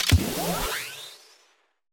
Shield Pickup

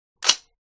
pull; scout; guns

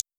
click; blackjack

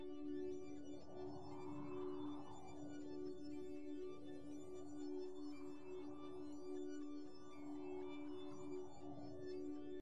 intro loop

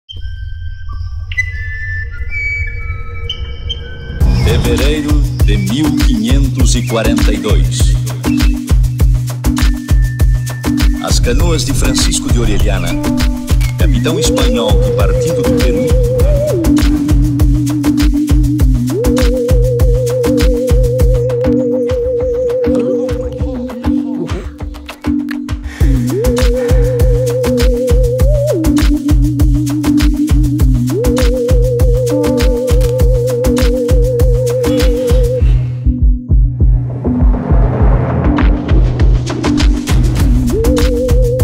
music

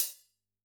hihat, jazz

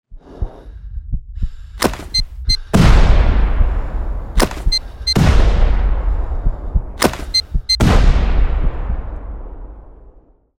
raid

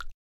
low high button; hilo

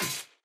block metal destroy

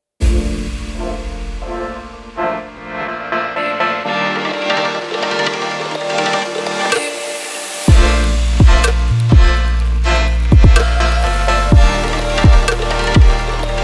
Creative Electronic; raspberrymusic

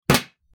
hit; fight; bitfighters

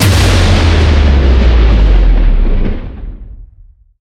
super Mega Win; asgardian stones

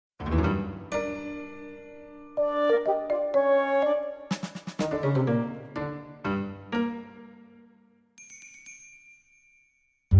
main page bgm

